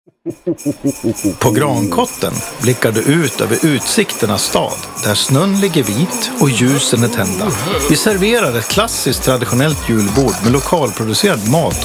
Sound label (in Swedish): Juljingel